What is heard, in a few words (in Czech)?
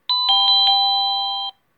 melodie